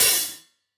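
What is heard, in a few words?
close Open Hat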